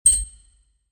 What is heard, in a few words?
UI comform sound